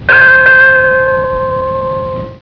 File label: ding; Caroline; Mi Amigo